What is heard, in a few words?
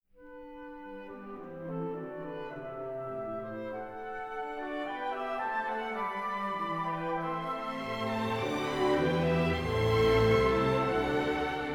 Enigma Variations, for orchestra, Allegretto; Elgar